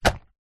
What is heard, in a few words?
sport swimming cap latex drop ground; zapsplat